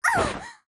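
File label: Npc Harpy Damage; FlyForInsanity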